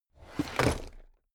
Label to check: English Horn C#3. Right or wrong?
Wrong